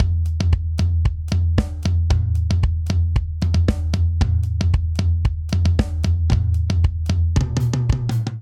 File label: Polyrhythmic Dance Verse Variant 114 bpm; MIDI Clips; Ableton; Factory Packs; Drum Booth